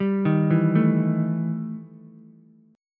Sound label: E Minor Major 7th Double Flat 5th E Ukulele; arpeggiated; JGuitar